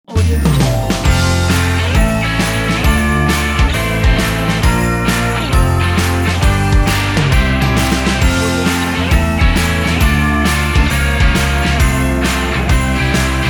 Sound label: christmas rock logo